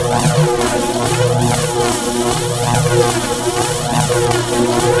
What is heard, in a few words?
weapon spark; Earth and Beyond; EA Games